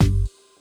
808 Snare and Bass, Drums; BB 2HTC Samples Vol 4

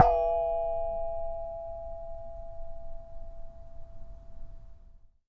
Flick, Japanese Pull Saw

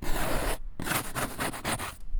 Scratchy Fabric; BB Retail Therapy Sample Pack

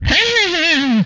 Inhuman Death, Vocal; retro arcade

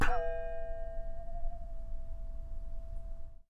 Wop, Japanese pull saw

